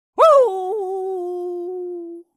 zvuki s voem sobaki

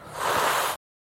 zvuki shipenie kota

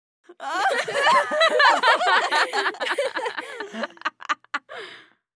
zvuki zhenskogo smekha

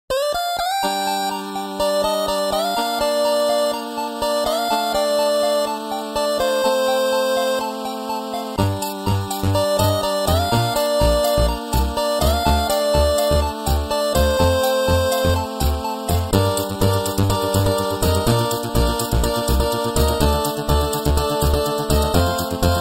8-bit muzyka na sotovyi telefon ringtony

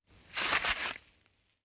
zvuki gubnoi pomady

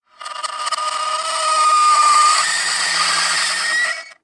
zvuki tsarapin na metalle

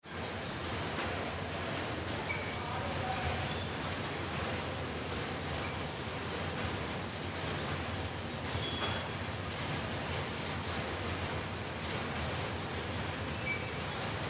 zvuki bagazhnoi karuseli v aeroportu

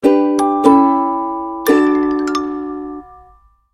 zvuki s effektom zaversheniia, okonchaniia

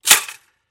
zvuki tostera

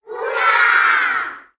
zvuki i kriki ura

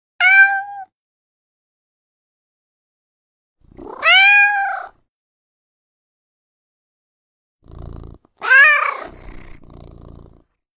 originalnye ringtony i uvedomleniia nokia asha 302

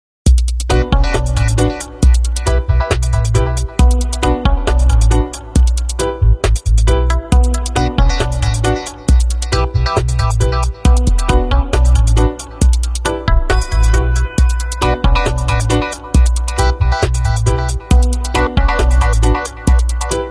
originalnye ringtony Nokia 5310 XpressMusic

